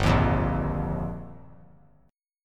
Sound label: G Minor 7th Sharp 5th; Gm7#5; strummed; JGuitar; 7,10,8,8